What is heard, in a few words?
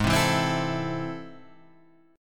A Minor Major 7th, G sharp